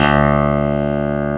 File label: HCLAV G3; East West; Global Sounds